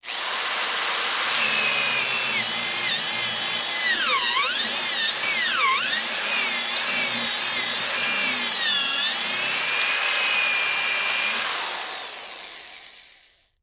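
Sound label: Electric Drill Small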